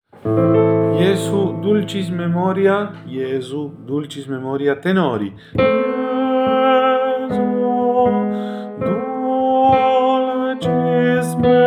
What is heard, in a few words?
VICTORIA MEMORIA TENORS; Jesu dulcis in memoria